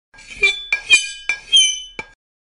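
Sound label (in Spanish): cuchillo plato, un cuchillo arañando un plato; sonidos insoportables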